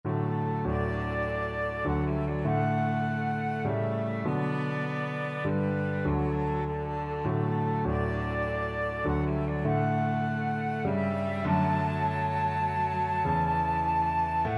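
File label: amazing grace, flute cello piano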